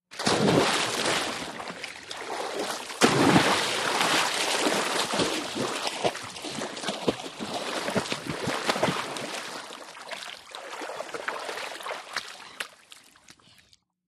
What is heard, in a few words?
Splash Jump Water Splash